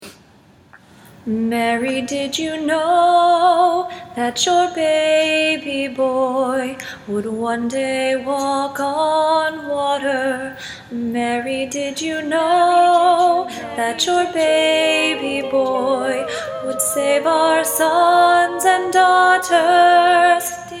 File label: Alto 1